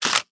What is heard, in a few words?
Camera; Minecraft: New Nintendo 3DS Edition